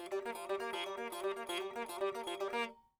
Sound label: sitar